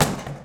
Foley Mid, Drum Bits, Sweeteners

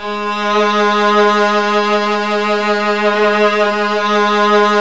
Virtuoso Strings

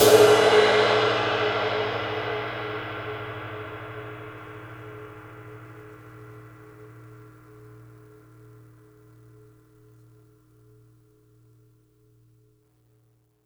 ride - crash; JazzFunkKit

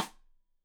Side Stick Stereo; Deeply Sampled Drums

